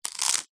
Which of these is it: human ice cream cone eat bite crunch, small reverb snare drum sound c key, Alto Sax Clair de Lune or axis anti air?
human ice cream cone eat bite crunch